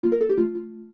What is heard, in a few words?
Pause Menu Turn Right; Turn the page on the pause menu; OOT